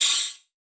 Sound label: Multimedia Internet CD-Rom Flash Ding